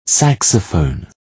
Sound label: saxophone